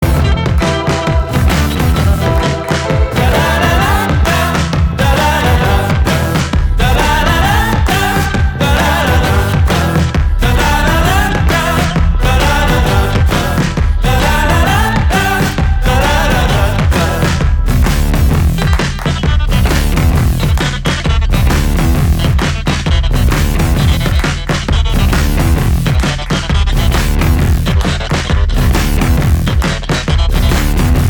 Ringtone

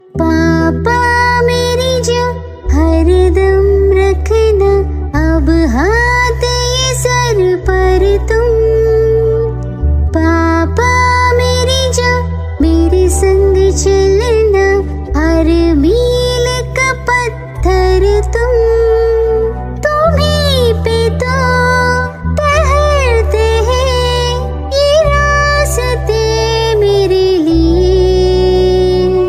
Ringtone Baby Version

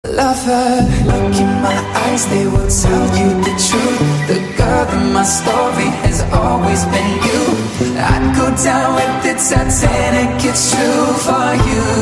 Remix

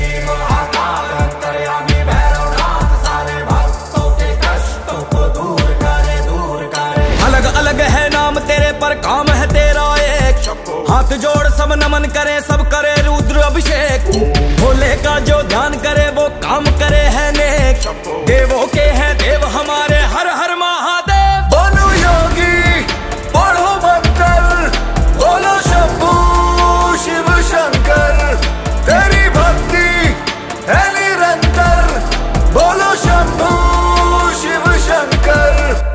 Song Ringtone

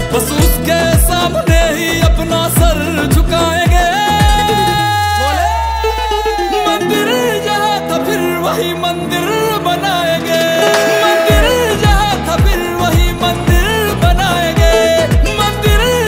Ringtone

